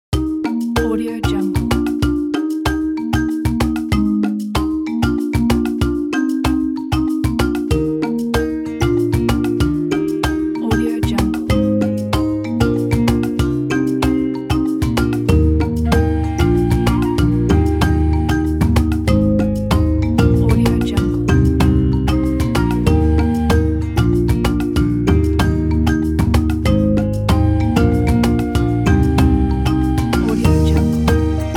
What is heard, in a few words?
africa